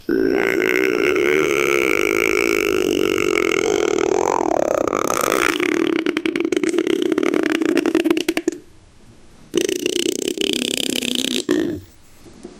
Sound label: pooping, comedy